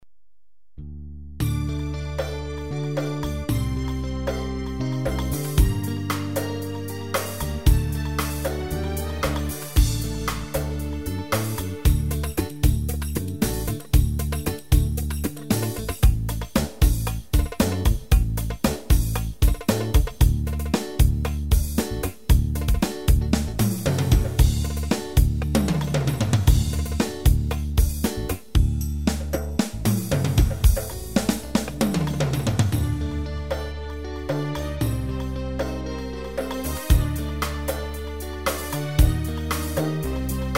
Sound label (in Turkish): bolero, ketron ritim